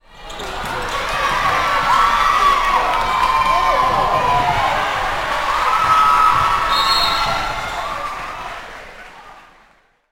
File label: Basketball Crowd Cheering